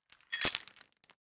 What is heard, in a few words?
Light chains handling